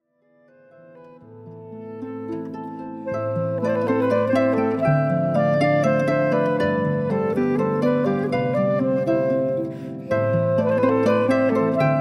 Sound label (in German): Polska Jovano, Harfe; Klanghaus Eibelstadt